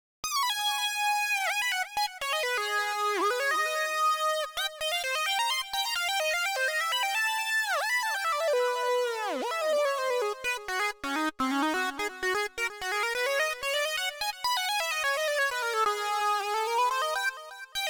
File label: Synth Solo; music; Final boss